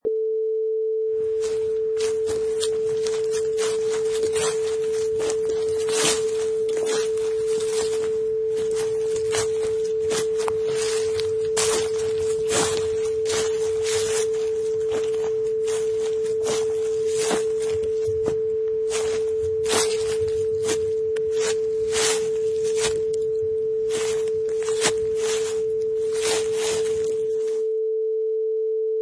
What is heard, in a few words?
Walking through Leaves